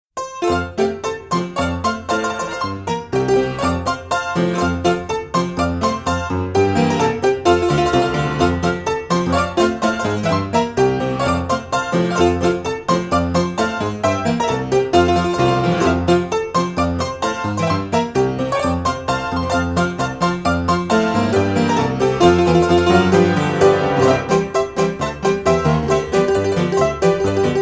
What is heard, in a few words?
saloon piano medley